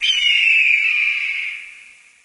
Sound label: medieval falcon; Source Engine; tf